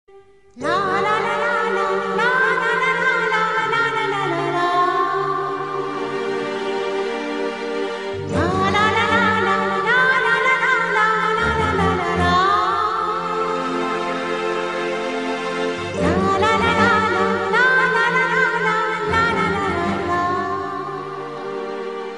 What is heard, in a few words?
Romantic Tune